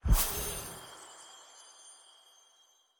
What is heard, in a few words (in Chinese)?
试炼刷怪笼开始生成物品声